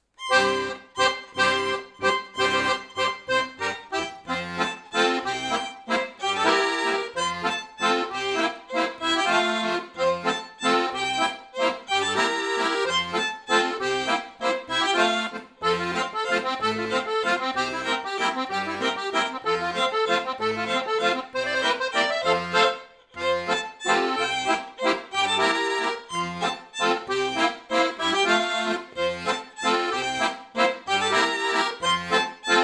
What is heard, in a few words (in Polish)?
W stodole myszka podklad muzyczny; gwara